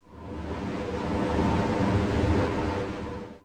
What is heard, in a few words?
subtle wind